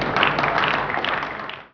CROWD

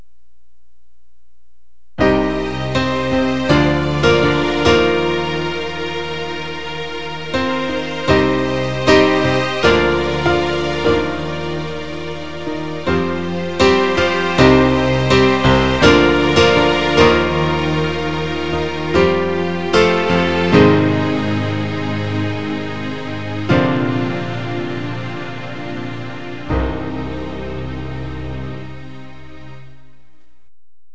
SAD SONG

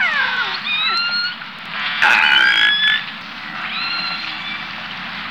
FLIPPER, ANIMAIS

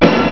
ORCHSTRA

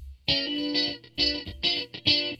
GUITAR, ROCK POP; MAGIX

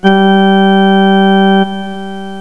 ORGAN

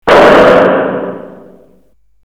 SHOT; cubase

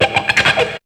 GTR NOISE; BEAT2000

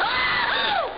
WAHOO

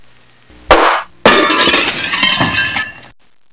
GLASBRK

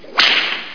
VINE WHIP; JOHNNY

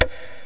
SWITCH; JOHNNY

